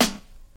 wet rap snare a sharp key, snare tuned to the A# note. Loudest frequency: 2937Hz; snare drum samples